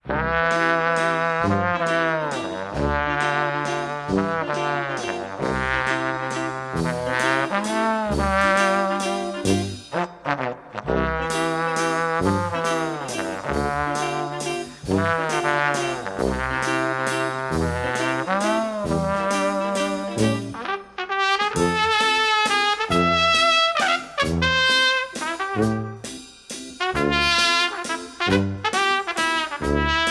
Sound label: one clown band